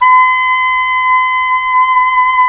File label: SAX SOP MF, Soprano Long